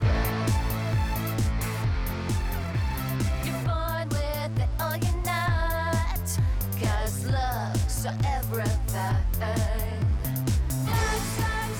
Music; R&B Divas LA S3; LAUNCH

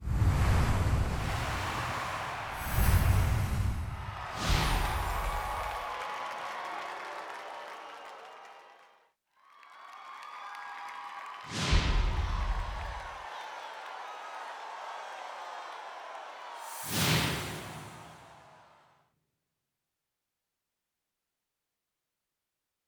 SFX; Unsung Generic; New Ep

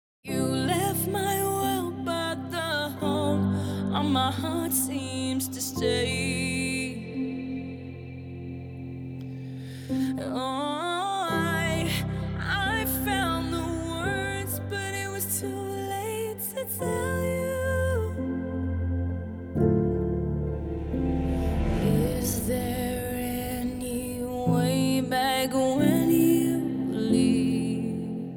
Music; Bad Dad Rehab; Bad Dad Rehab trailer

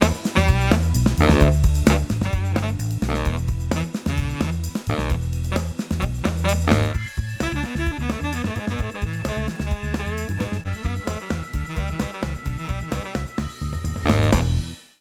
Music; The Rickey Smiley Show; Contest Winner

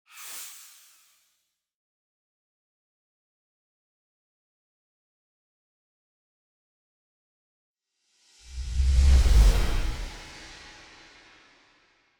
SFX; Unsung Hollywood; Cooley High

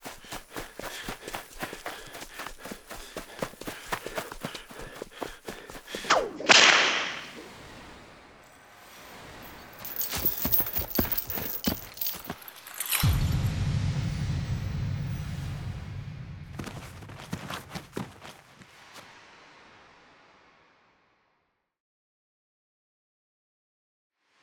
SFX; Roots In HD

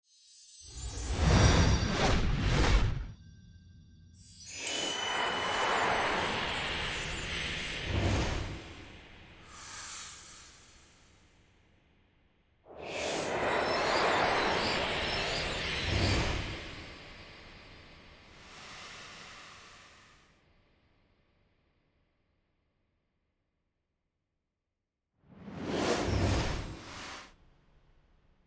SFX; Love That Girl S4; CONTEST

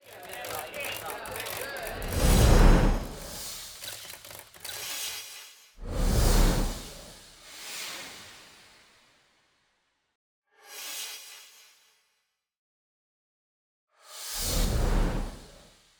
SFX; R&B Divas LA S3

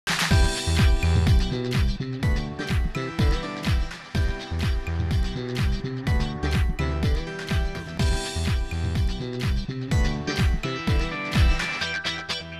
Music; US Army Unsung Open

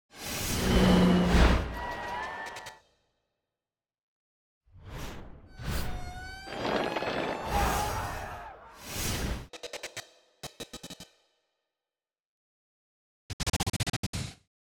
SFX; NewsOneNow

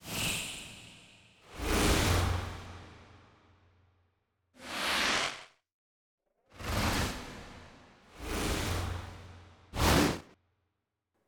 SFX; R&B Divas ATL

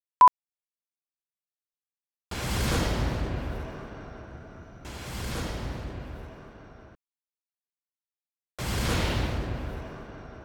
SFX; TV One Empire; 2 Seasons

